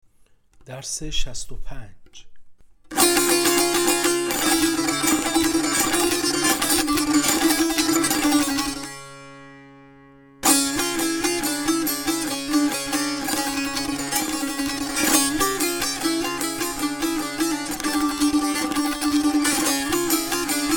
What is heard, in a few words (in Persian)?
مشق تنبور